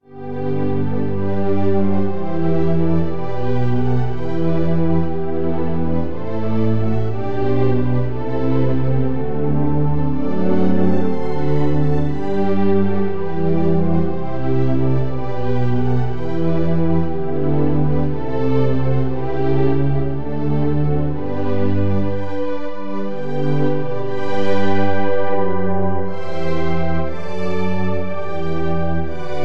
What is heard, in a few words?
warm pad